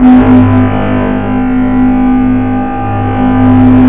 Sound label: odd charge